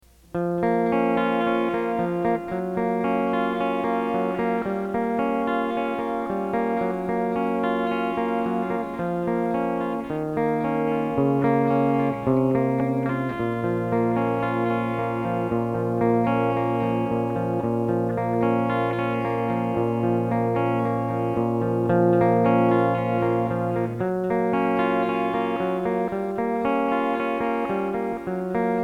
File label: guitar song